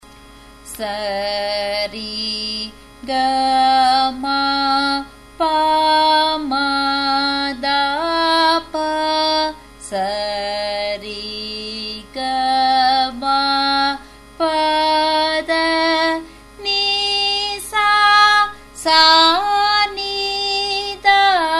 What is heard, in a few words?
Sarali Varise G sharp, music; Carnatic Music